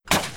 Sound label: shoot; network games